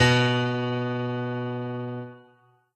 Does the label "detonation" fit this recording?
No